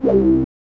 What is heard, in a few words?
SNTH BASS ELCTR 140 A, Techno